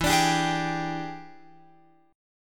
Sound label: E 7th Sharp 9th Guitar Open F, chord; E7#9; strummed; x,11,14,14,11,14